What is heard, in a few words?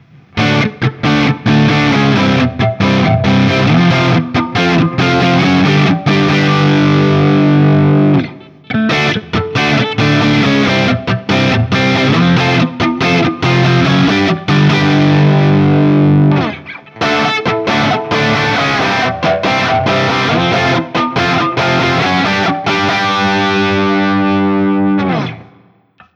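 Guild X97V JCM800 A Barre, guitar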